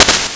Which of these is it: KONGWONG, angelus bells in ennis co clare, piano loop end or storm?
storm